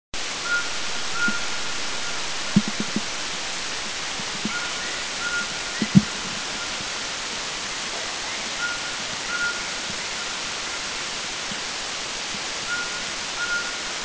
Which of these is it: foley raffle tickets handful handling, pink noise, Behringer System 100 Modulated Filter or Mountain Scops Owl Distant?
Mountain Scops Owl Distant